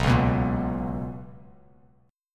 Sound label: B Major 7th Suspended 2nd Bass Standard, chord; BM7sus2; strummed; JGuitar